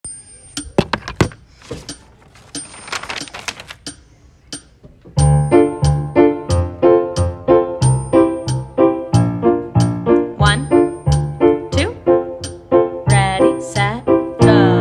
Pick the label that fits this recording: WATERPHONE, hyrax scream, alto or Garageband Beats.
alto